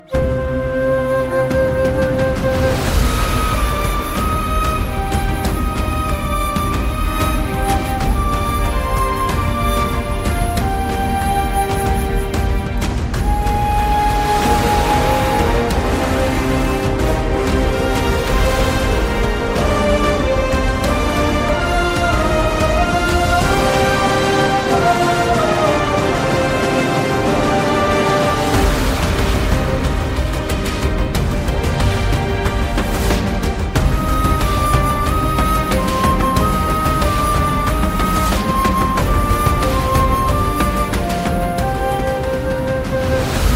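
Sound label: background music Ringtone; Dhanush; Vaathi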